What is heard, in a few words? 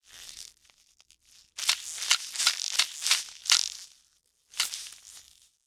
ant warning